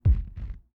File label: robot footsteps; prosoundfx